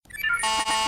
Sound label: beep ringtone; jarvis